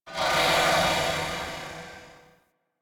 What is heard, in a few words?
Corpus Ship Metal One Off; Ambience; Warframe; Corpus Ship Remaster